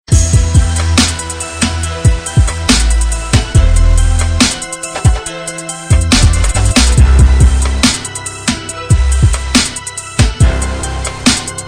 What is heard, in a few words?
hip hop beat